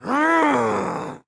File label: newspaper rarrgh; soundspvz